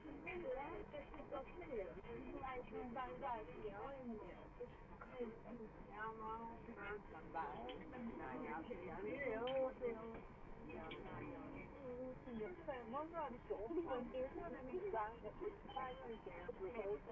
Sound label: background